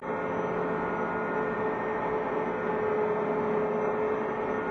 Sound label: turbine rpm low interior, tanks; War Thunder